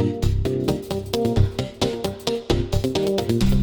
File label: Funky 125-132 Cm7